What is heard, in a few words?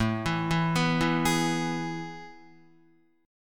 A Major 7th Suspended 2nd, Guitar; AM7sus2 arpeggiated; 4,7,4,4,4,4; JGuitar